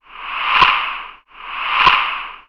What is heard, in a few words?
finger, drums; CMP - Analogon